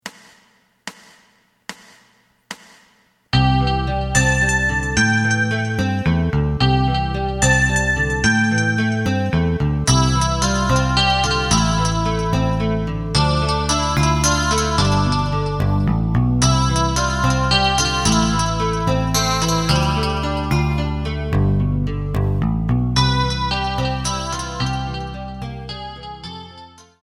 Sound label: drum